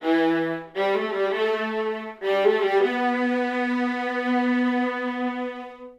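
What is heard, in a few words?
Violins DnB E minor 160bpm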